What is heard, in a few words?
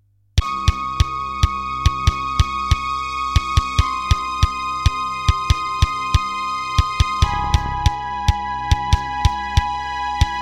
Aztech Sound Galaxy Wave Power; sigil doom episode 5